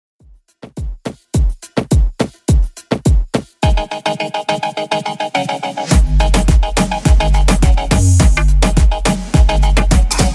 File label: Moombahton Remix 105bpm